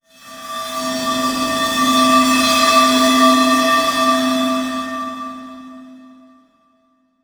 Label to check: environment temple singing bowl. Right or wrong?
Right